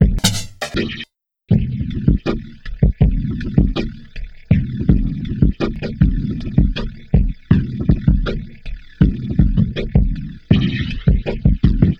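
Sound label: Loop, beats; Sonic Foundry (Sony Creative Software); Esoterik Beatz; Quesy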